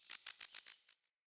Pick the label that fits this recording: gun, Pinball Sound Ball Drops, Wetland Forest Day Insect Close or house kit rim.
Wetland Forest Day Insect Close